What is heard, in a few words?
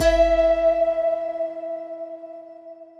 bom prize normal; Great Book Of Magic Deluxe; Wazdan